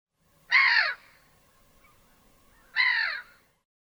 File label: Black-headed Gull